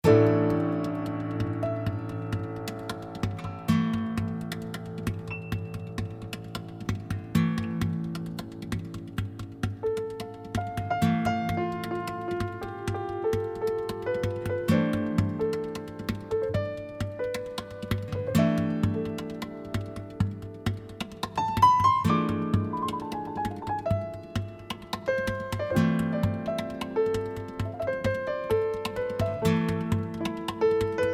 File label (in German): Zwischenmusik; Wilhelm Busch